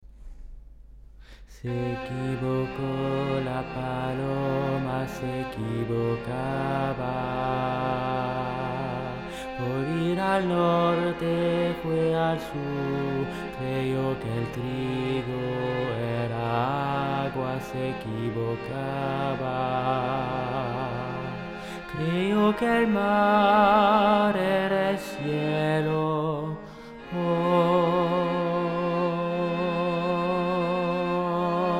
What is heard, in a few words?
se equivoco altos